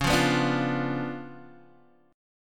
C sharp 7th Flat 9th Guitar Open G, chord

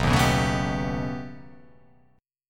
B Augmented 9th B, chord

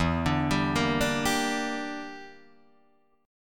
E Minor 7th Flat 5th E, Guitar Drop D

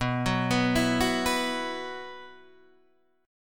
B Suspended 4th, chord; Bsus4 arpeggiated; JGuitar

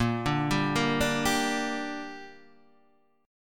G Minor A sharp, chord

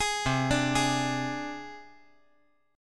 C Suspended 2nd Sharp 5th C down

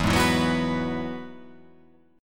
E Major Add 9th

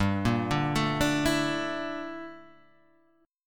G Minor 6th Add 9th G

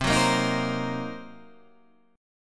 D sharp Minor 6th C

